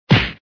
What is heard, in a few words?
punch